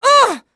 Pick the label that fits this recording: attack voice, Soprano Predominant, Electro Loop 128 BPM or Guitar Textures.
attack voice